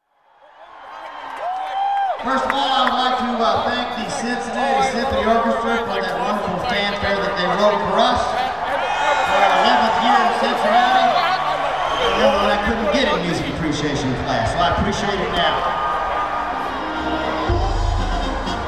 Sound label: Fanfare